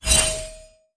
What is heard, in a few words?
missing ping